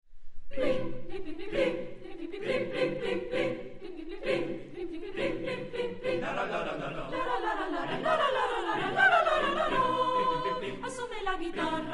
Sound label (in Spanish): coral; 5a Bienal Coro Mixto Pena Santa; kodaly